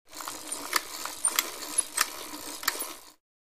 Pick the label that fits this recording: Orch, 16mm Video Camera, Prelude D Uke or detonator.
16mm Video Camera